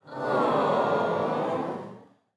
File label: sigh wrong; Interactive games; Grammar Games; demonstrative adjectives